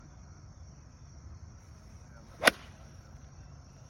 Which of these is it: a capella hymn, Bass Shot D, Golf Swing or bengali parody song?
Golf Swing